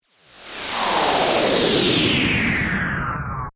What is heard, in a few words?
Hit Laser Descend, Reverb; Production, Element, Imaging, Accent, Transition